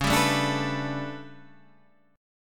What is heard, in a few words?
A 11th Db, guitar chord